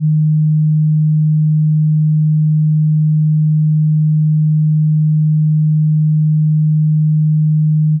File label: signal fade; obsidian path